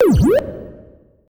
Fruit Eat; Pac-Man Championship Edition 2 Plus

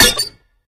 Metal Pipe Damaged; Angry Birds

